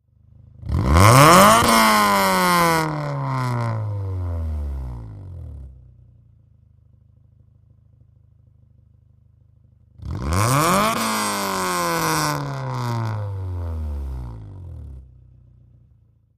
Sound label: Integra, two close-up hard revs and idle, Acura Integra